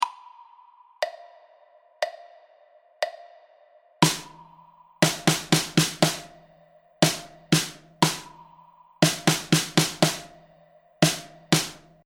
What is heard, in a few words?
stroke, drum